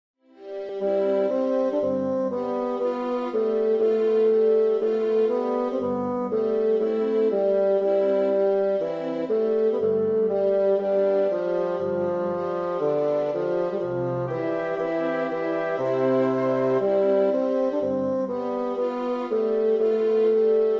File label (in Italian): melodia e accompagnamento; Verdi